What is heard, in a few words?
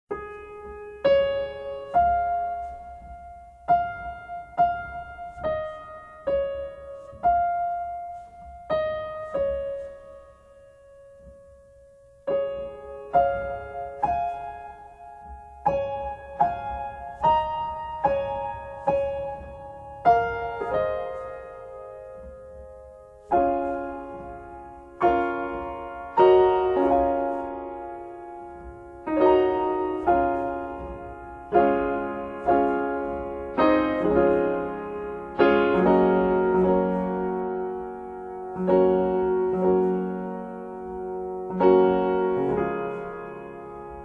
were you there piano solos